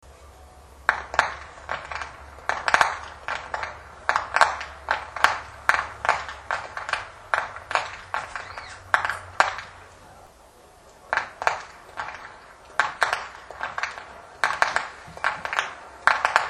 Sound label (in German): schnalzen, flachgau; fuerstenbrunn